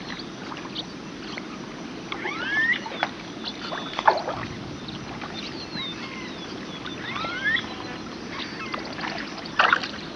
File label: Birds Near Still Waters